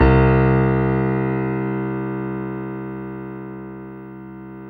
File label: PIA MICRS, MICRO-PIANO, Roland; Sound & Vision - Gigapack II